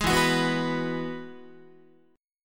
E Major Add 9th, Guitar; strummed; JGuitar

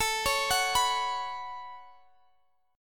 A 6th Add 9th, Guitar Open F, chord; A6add9 arpeggiated; x,x,21,20,21,18

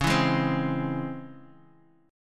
Db Minor Major 7th Db down Guitar DADGAD

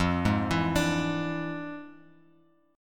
F Minor Major 13th, Bass Standard; arpeggiated; JGuitar

